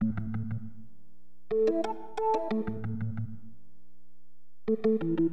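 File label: organ sequence, keyboards; HipHop; LiveAct Pool 1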